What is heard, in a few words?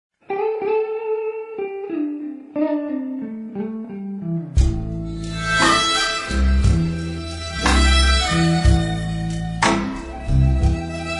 Blues